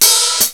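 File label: HI HAT OPEN CLOSE, CYMBALS; FAIRLIGHT CMI IIX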